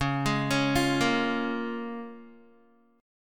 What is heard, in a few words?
Db 6th, Guitar; arpeggiated; tuning=4E,4A,5D,5G,5C,6F; 9,11,11,10,10,x